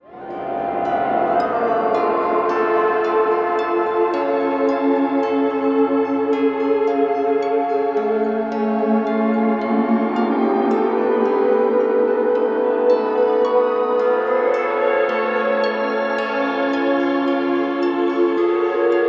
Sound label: Shimmer Interval; StarLab